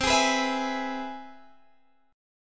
C Augmented Major 7th C down Guitar Half Step Down